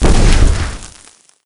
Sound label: imp exp; doom3